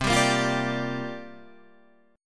C 7th Suspended 2nd Suspended 4th C

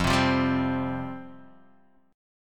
F Suspended 2nd F Guitar DADGAD

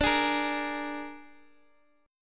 Gsharp Suspended 2nd Flat 5th

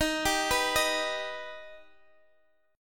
D sharp Augmented D sharp, Guitar, Drop D; x,x,13,12,12,11

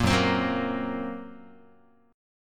Gb Major Flat 5th, down, Guitar; strummed; JGuitar

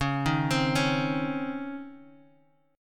Db Major 7th Suspended 2nd Db Bass Standard; 21,18,22,18; DbM7sus2 arpeggiated; JGuitar

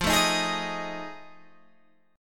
F 7th Sharp 9th, Guitar, Open G

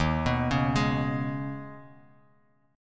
Eb 7th Suspended 4th Sharp 5th; Eb7sus4#5; arpeggiated; 11,14,11,13; JGuitar

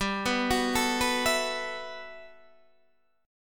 G Major 13th, Guitar Open D

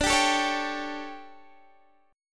D-Major 7th Suspended 4th, D, Guitar, Standard; strummed

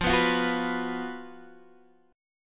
F sharp Major 7th Suspended 4th

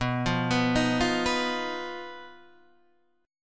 B Major Flat 5th B Guitar DADGAD

